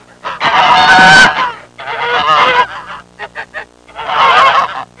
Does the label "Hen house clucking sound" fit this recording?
Yes